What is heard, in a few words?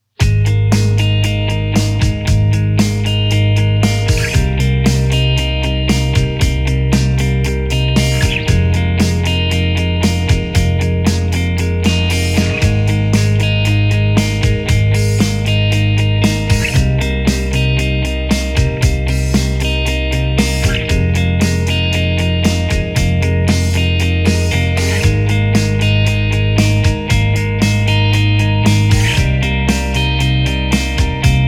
3 Chords